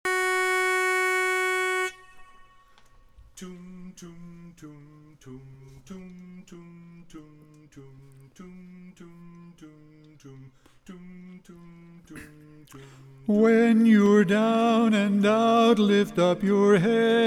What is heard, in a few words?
Lead